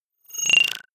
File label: data transfer beam